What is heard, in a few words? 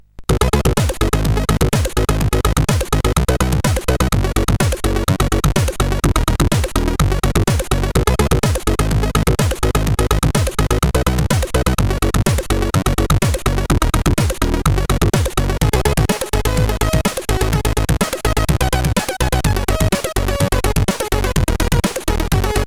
Intro, sid CSG8580R5; hvsc; Spike